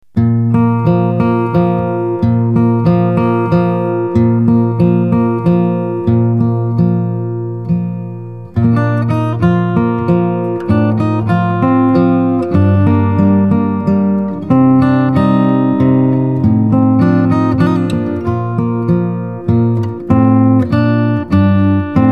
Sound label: waltz